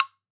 Agogo Hardwood, World Percussion; Cymatics; Emperor World Percussion

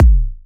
Techno Kick

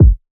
Kick Trap

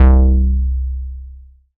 Juno 106 BASS Rubber C; Synth; One Shots; Cymatics; Fantasy Synth Sample Pack

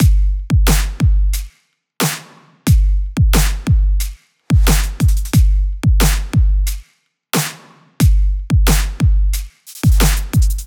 Trap 90 Main; Drum Construction Kits; Loops; Ghosthack; Drum Mega Bundle Demo; Drum Hero 5; Trap 1

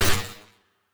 Scifi Alien Weapon; Game Weapons; Military; Game Essentials

